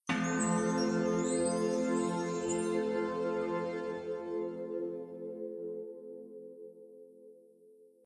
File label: chimes; Unciv; Added unique sound effects for multiple actions